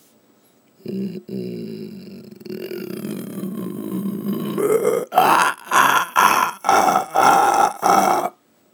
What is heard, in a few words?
fly